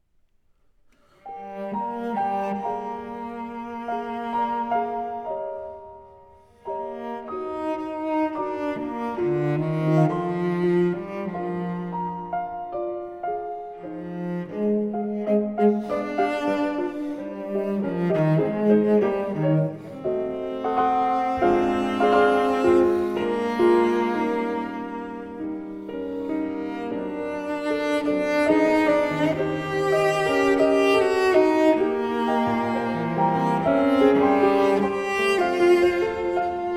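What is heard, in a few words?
piano cello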